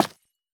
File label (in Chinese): 方解石破坏声